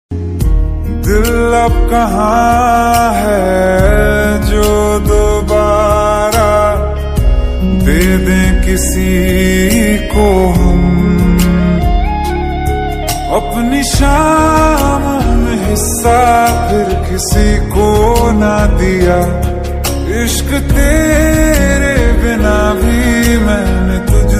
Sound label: Sad Song Ringtone